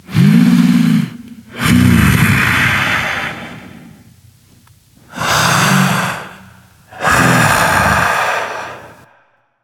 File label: breath; smite; janus